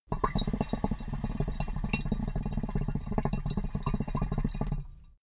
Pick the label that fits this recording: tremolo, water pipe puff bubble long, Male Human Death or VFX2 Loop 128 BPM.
water pipe puff bubble long